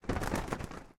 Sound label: Doublewing Flap